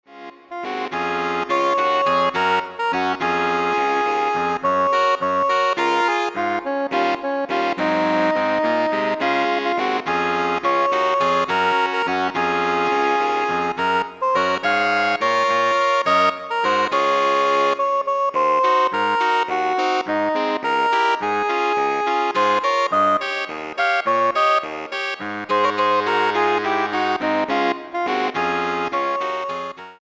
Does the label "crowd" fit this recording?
No